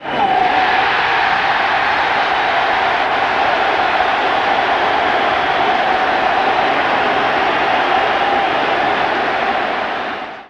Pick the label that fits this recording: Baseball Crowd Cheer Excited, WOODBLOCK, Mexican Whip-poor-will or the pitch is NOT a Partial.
Baseball Crowd Cheer Excited